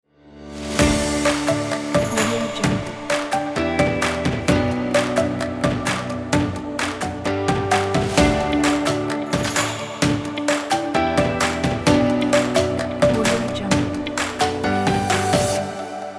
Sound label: upbeat corporate; joysound